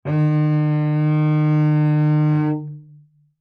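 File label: Cello arco fortissimo sul C D2; MIS Pitches - 2014; Strings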